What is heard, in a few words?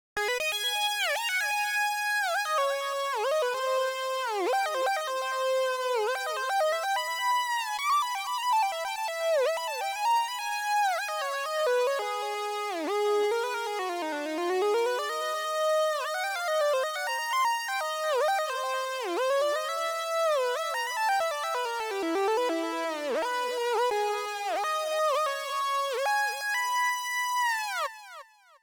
Synth Solo; music; Final boss